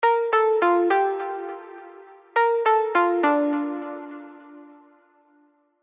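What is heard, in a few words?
pluck, Telegraph; tropical pops; passionfruit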